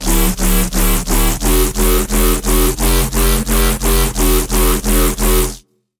Wobble - Dirty, Serum 2; Wobble - Dirty Track; Routed Sound; Serum 2 Expansion - Chaos Bringer Vol.1

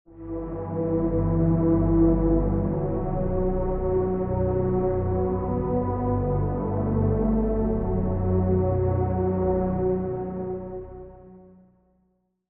Pad, Vital; Stasis Space; Routed Sound; Vital Expansion - Star Scavenger Vol.1

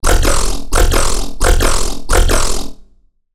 Bass - Liquid Creature; Routed Sound; Vital Expansion - Chaos Bringer Vol.1

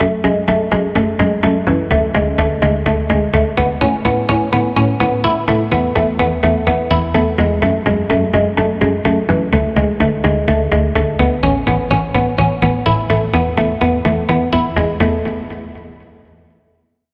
Pluck; From The Ashes; Routed Sound; Vital Expansion - Flame Summoner Vol.1

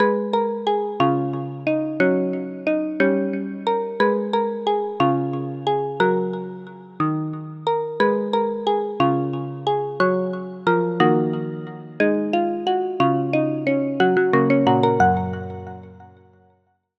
Pluck; Routed Sound; Spire Expansion - Story Walker Vol.1

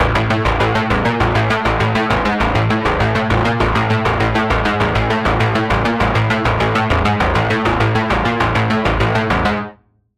Pluck; Routed Sound; Spire Expansion - Doom Carpenter Vol.1

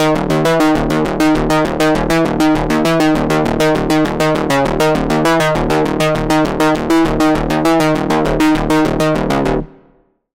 Pluck, Sylenth1

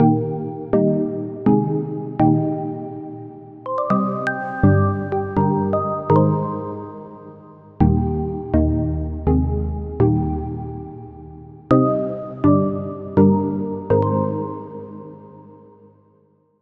Key; Routed Sound; Sylenth1 Expansion - Story Walker Vol.1

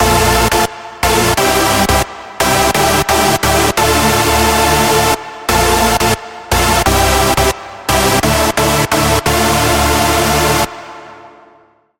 Chord; Routed Sound; Spire Expansion - Flame Summoner Vol.1

